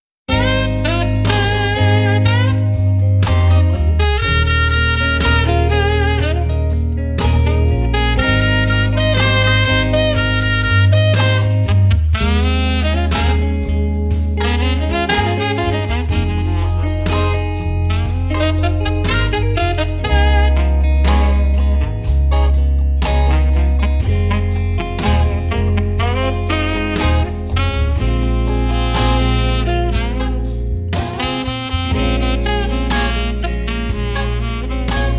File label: Jazz